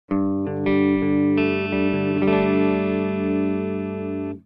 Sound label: Electro-Voice Cobalt7 - Clean (Neck)